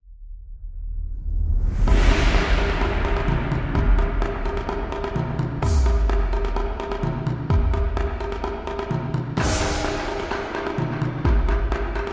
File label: Asia Taiko Japan Drums